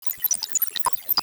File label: red frog